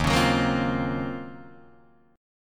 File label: Eb Minor 13th Eb down Guitar DADGAD; Ebm13 strummed; JGuitar